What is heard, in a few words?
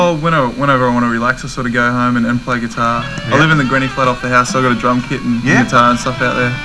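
guitar drums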